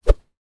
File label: stick swing swish through air; foley